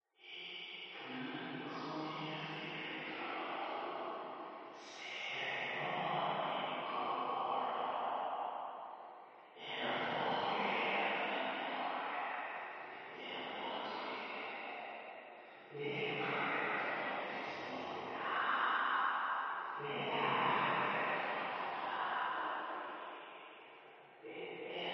demon whispers binaural chanting devil worship voices